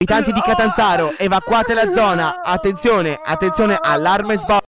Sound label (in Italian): allarme